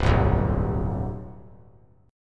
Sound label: C Major 7th Suspended 2nd C down, chord; CM7sus2 strummed; JGuitar